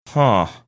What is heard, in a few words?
"huh"